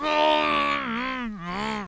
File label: Gargle